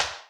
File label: Ana Bz Rim, Electric Snare, Yamaha DTXpress